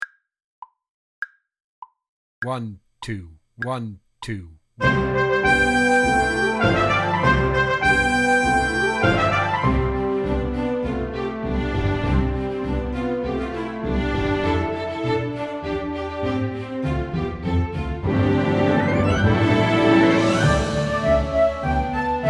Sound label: March for One Backing, drum kit